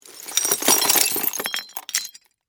Crash Glass Debris, Crash, Glass, Debris, Movement; Sound Effects:Materials